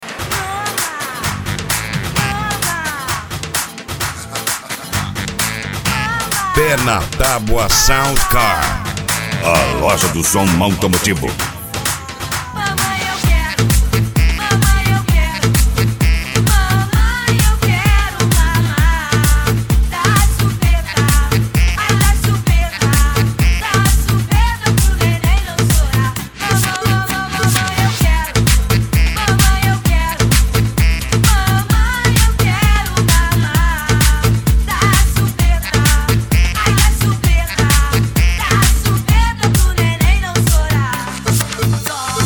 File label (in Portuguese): sound car carnaval